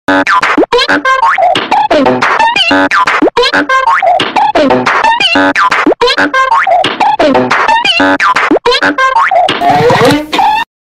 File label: rubber band